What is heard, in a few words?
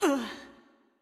Hurt; Going Under; Jackie